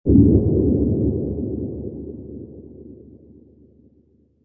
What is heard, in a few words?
Space Explosion Low, Distant explosion in space, very long decay, long reverberation; Science Fiction Sound Effects; Lynne Music